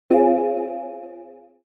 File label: Digital futuristic confirm